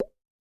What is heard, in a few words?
Pop